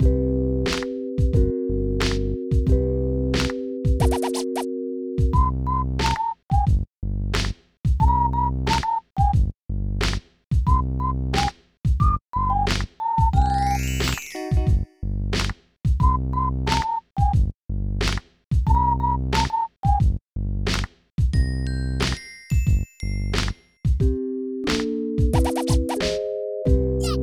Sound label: Hop, Aircheck, Hi-Fi